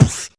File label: Beatbox Snare, Human Beatbox